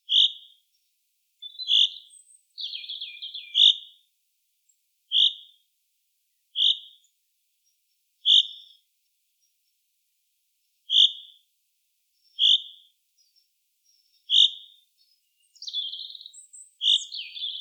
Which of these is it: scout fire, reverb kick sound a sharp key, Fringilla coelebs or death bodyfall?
Fringilla coelebs